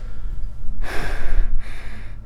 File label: female, Realistic Fatigue Voice; oblivion